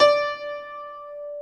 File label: D4 R+R PNO; Keith Emerson Signature Series